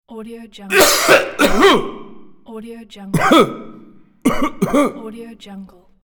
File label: cough